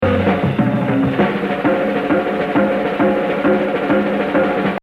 Solo, Jazz Drumming; Stan Levey; The Evolution of Jazz Drumming (Gottlieb)

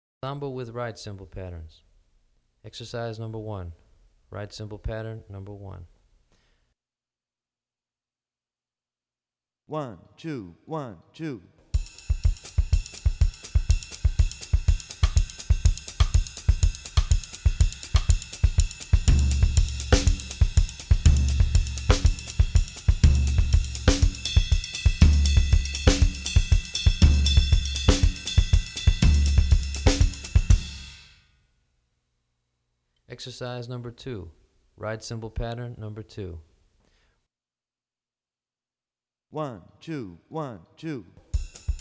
Samba with Ride Cymbal Patterns; Brazilian Rhythm For Drumset; DaFonseca, Weiner

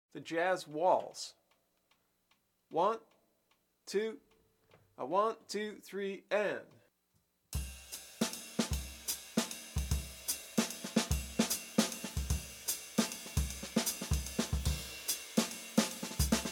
3-4 Waltz, Bop Drumming; The Art Of Bop Drumming; Riley

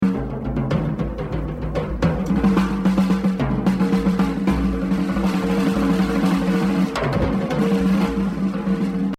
Exercise, jazz drumming; Rufus Speedy Jo; The Evolution of Jazz Drumming (Gottlieb)

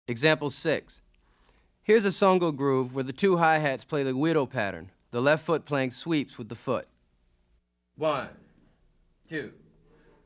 Afro-Cuban Grooves For Bass And Drums; Afro-Cuban Grooves For Bass And Drums (Goines, Ameen); Drummer version

